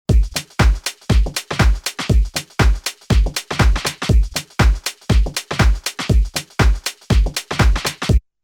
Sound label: Reason 10 Loop Supply Deep House